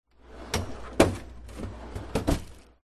freezer internal sliding tray compartment plastic slide